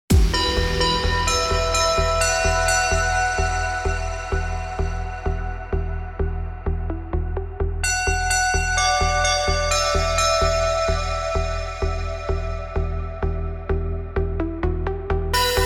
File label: (Glockenspiel); Sunshine Live